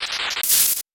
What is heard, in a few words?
Electrical Attack; Pikachu; Super Smash Bros